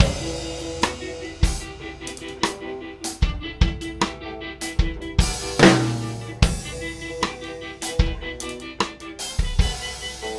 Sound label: loop